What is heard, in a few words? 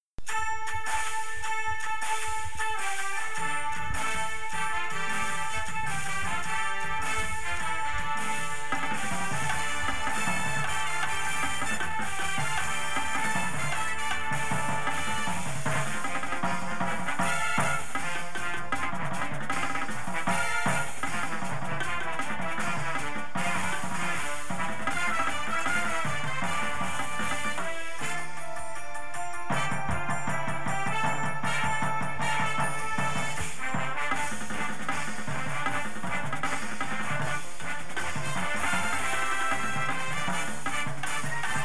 tenors w music, drumline